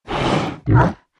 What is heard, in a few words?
dragon spins the wheel; koi princess